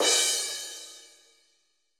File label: cymbal, Roland MC-909